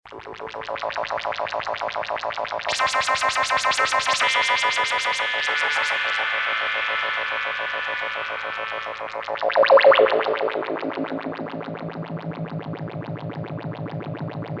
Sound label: LFO Filter Rect